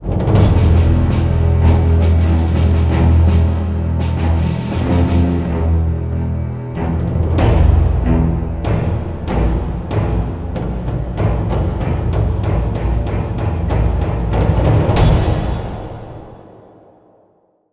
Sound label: round start